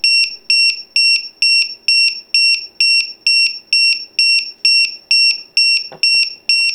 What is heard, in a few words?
in alarm